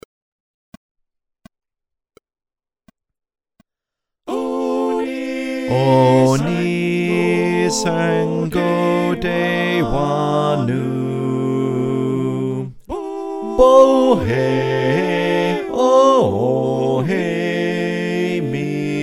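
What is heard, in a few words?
Bass, choir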